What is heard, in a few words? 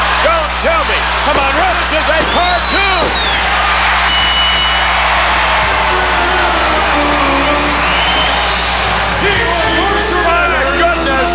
cartoon; doink survivor